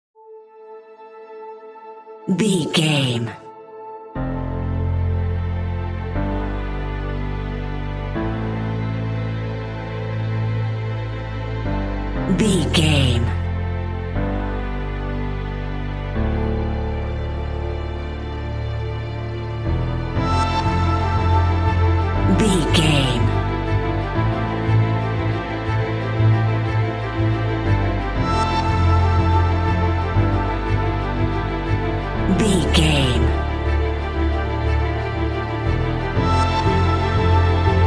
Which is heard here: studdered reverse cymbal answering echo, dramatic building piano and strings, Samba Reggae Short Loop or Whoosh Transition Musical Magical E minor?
dramatic building piano and strings